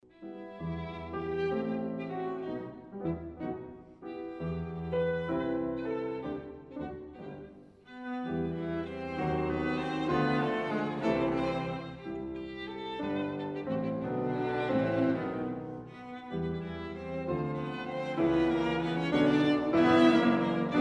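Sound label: piano quartet; kiel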